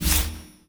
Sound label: drawer slide in; ui; custom lobby